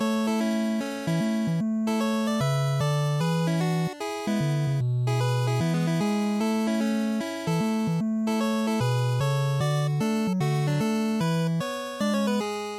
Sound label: NES General Title Loop 75